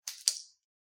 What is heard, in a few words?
pen click; soundscrate